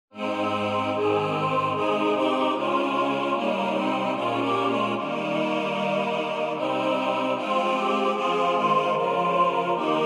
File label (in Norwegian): TUTTI; Bereden vag